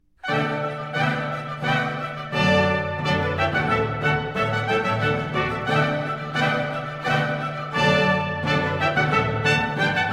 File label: baroque trumpets